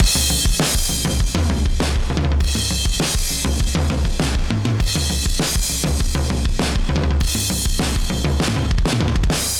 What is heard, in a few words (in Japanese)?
1176 全押し